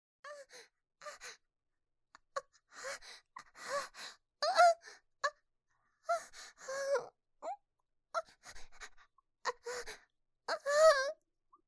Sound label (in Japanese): 喘ぎ 中 中